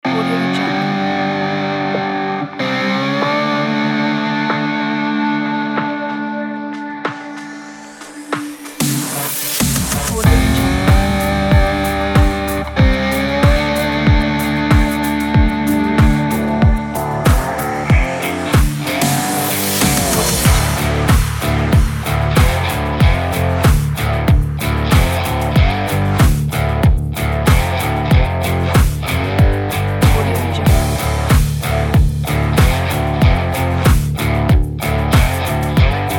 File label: serious rock